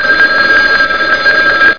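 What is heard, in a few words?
ALARM CLOCK; Spanish Net; MAPAROO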